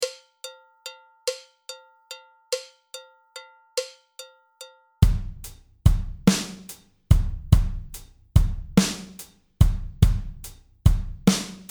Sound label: Groove 72 bpm